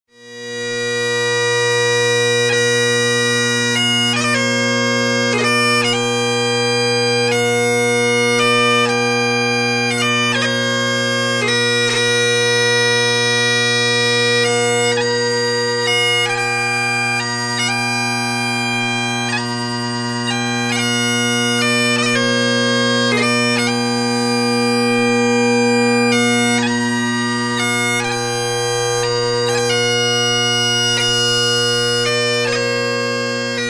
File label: Highland Pipe Medley; northern-ireland; folklife